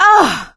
hurt minor, survivor, voice

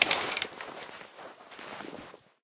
smg clip in; Left 4 Dead; Source Engine

